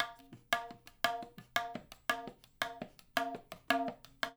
fill, percussion; InVision Interactive; Percussion Slam; OASIS

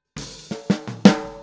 Boogaloo - Snare, 82 BPM; Drums; Intro; Sonic Foundry; Drums from the Big Room The Mixes

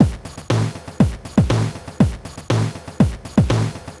Noise Beat; Beats; Sonic Foundry; Drums & Drones

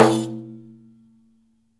DAIRES OPEN, Mediteranean Traditions percs

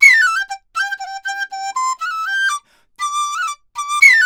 DIZU 120-C; INIS; Opium

